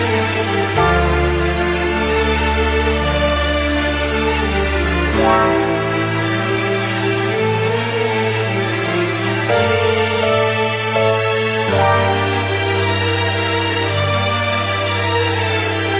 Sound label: Violon